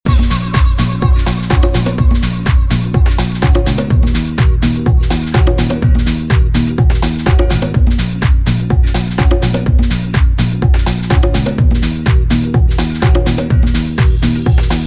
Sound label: Instrumental